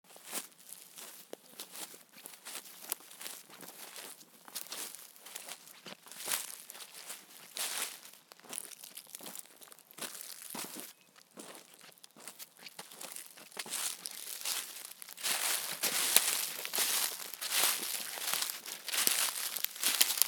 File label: Grass Steps